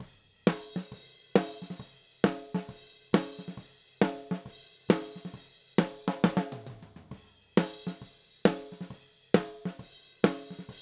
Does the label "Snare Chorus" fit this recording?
Yes